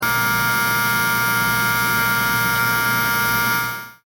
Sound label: Air Pump rotary vein